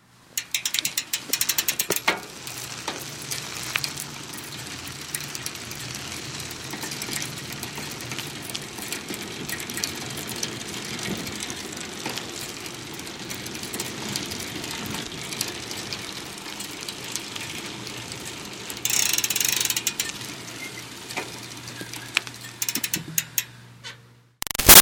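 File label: Bicycle Retro Cruiser Outboard Ride Med; Bicycles; Vehicles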